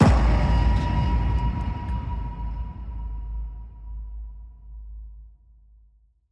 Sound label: cinematic hit big impact; soundscrate